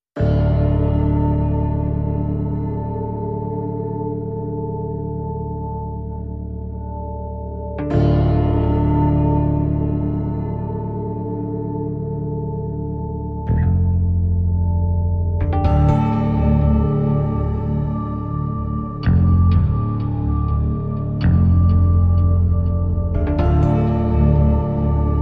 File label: No Perc